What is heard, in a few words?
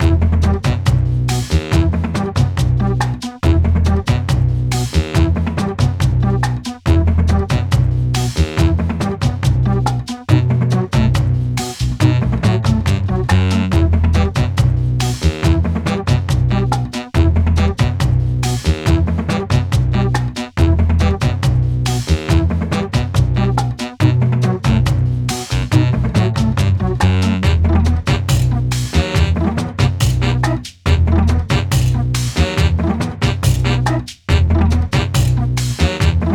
Credits; Quiplash XL; Jackbox Games; The Jackbox Party Pack 2